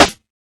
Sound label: subtle reverb acoustic snare sound c key